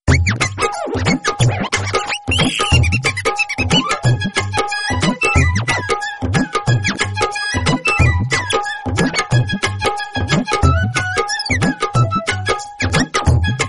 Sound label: funny background music tone ringtone